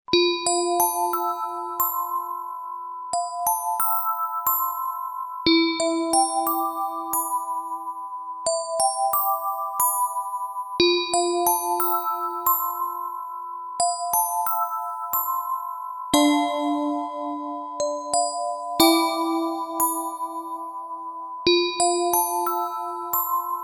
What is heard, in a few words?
MUSIC BOX